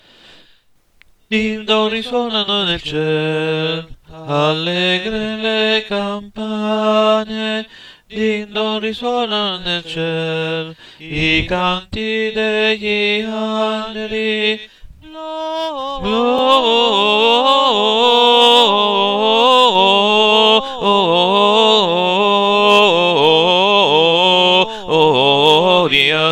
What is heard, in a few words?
Ding Dong Merrily, TEN